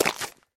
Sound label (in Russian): korotkij nepriyatnij zvuk hlyupa upavshej gryazi